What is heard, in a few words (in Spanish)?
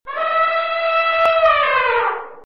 elefante sonido